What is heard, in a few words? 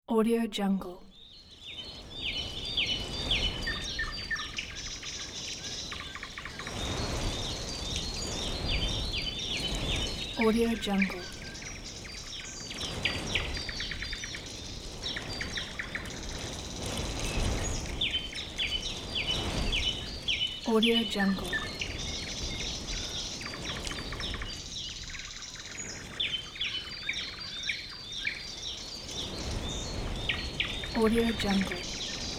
nature sounds